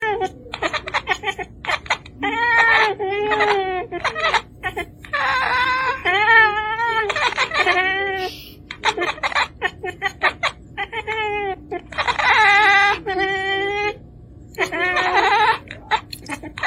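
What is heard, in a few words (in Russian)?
чирикающий котенок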